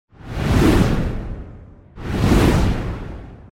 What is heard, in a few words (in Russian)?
smetajushhij; Звук ветра; Звук ветра для перехода в видео или для монтажного перехода в аудио монтаже